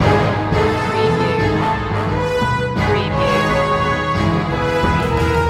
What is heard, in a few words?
orchestral action loop